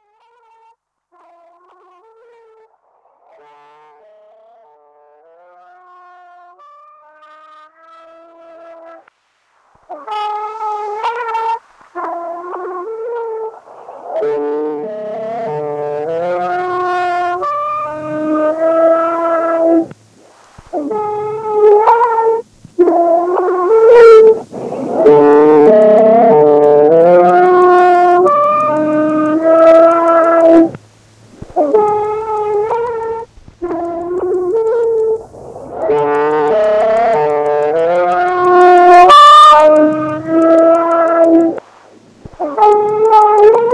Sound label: shrine dictorphone mexico, street recording